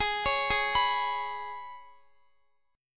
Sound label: Ab Minor Double Flat 5th, Guitar; Abmbb5; arpeggiated; chordshape